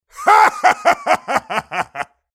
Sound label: mobster laugh